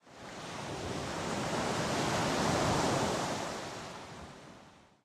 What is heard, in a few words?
Amb Beach Waves